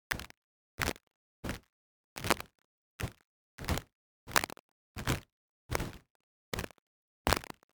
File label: synth forest underbrush; The NIW Project